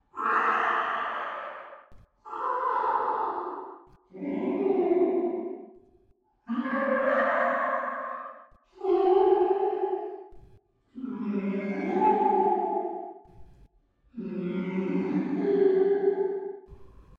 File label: ghost sounds